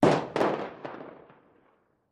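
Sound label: 2 Oz Black Powder Bomb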